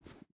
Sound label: footstep rubber boots sand jump start; Rust